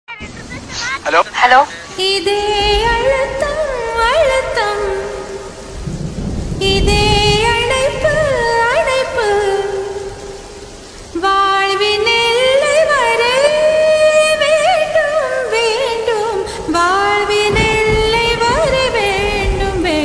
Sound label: emotional bgm broken heart; sakhi movie